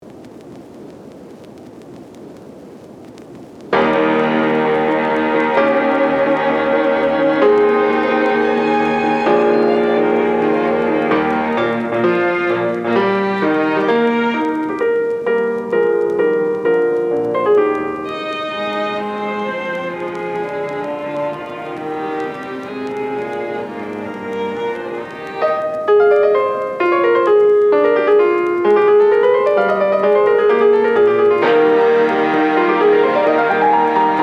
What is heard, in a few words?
Party Classical Mozart Loop